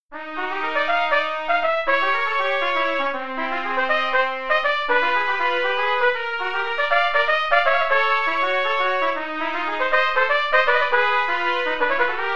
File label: Play-a-long, Bop Duets, qn=160, trumpet; Bower